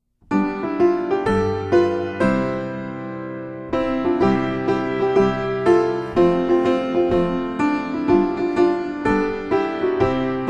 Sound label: Piano